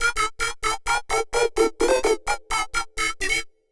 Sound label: 128 A, uk garage, Synths; Targa; 128bpm Lines n Loops